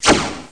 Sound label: Turn Undead; Death Blade